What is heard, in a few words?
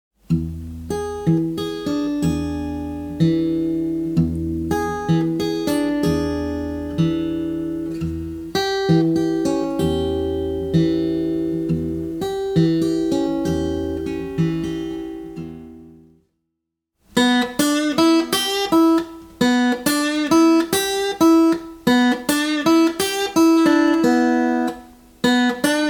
Blues; Blues Book 2; blues book volume 2